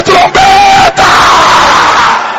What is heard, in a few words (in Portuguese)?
trombeta